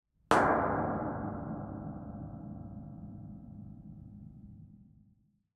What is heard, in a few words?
EFX SD Metal plate bang; Coll Anderson